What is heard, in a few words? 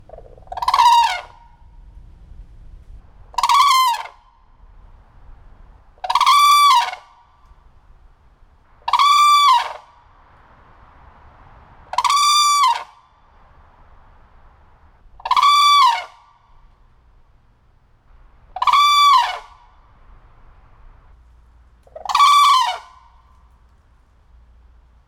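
red-crowned crane voice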